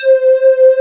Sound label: Synth Flute C4